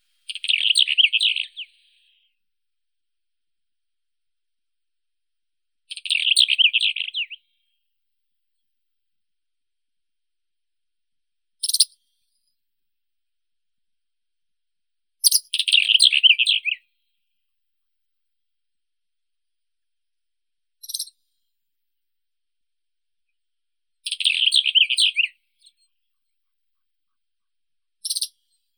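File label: Spanish wheatear, Oenanthe hispanica; kamenka ispanskaya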